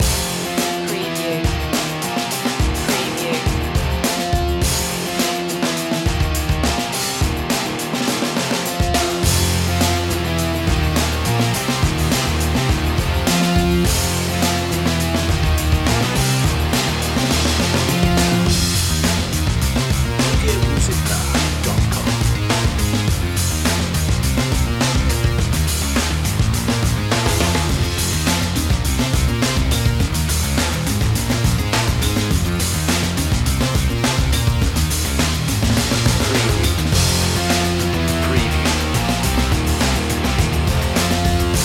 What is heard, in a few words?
rock slam underscore